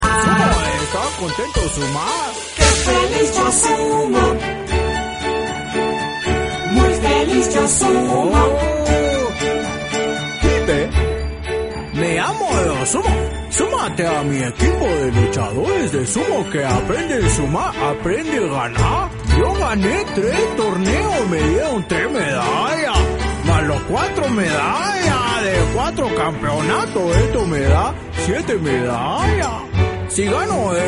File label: pipe